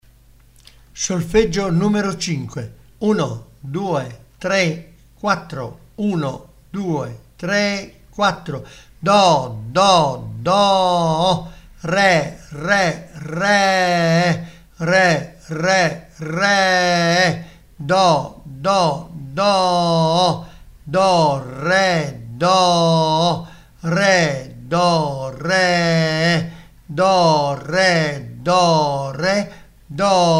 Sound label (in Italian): Solfeggio Il mio amico flauto